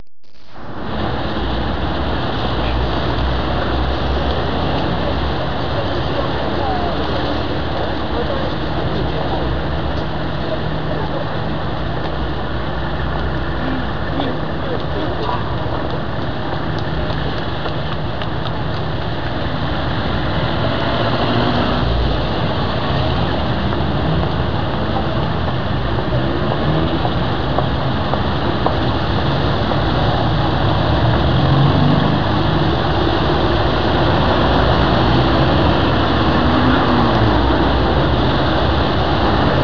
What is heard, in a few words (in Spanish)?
CALLE; El Juego De La Oca Loca